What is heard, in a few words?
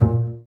BA PIZZ, Orchestra